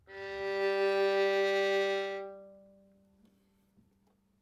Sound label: Violin G 4 Tenuto Vibrato